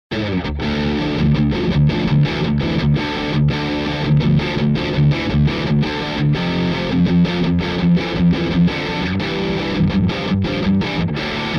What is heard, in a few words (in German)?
test gitarrensound